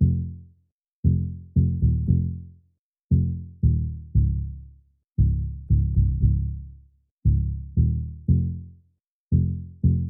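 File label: Bass Loop F minor 116 BPM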